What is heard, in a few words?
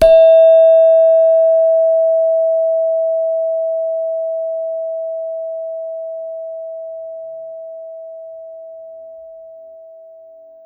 Gender, Gamelan